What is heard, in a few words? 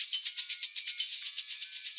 shaker; Crowdsourced Sample Pack; TRUTH-HZ; Drum Kit; Percussion